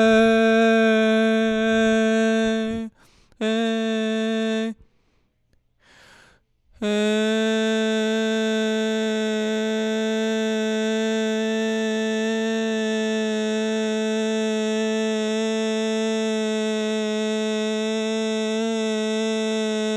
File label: Male Ambient Lead Voc